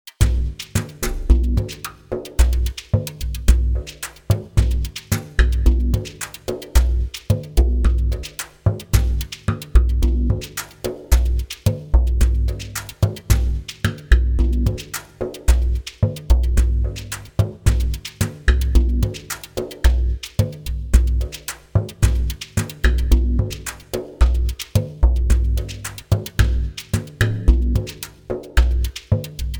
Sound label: Buffalo Drum; Buffalo Drum RMX